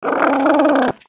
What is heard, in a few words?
mad dog